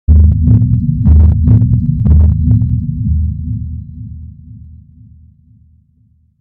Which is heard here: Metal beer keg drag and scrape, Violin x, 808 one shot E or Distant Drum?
Distant Drum